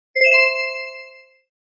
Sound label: cling; Monster Arena